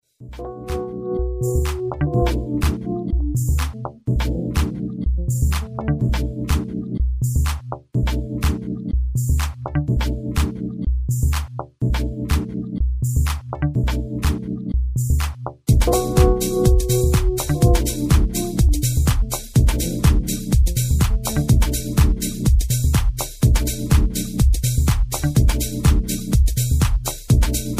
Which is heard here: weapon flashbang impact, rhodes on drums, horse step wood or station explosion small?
rhodes on drums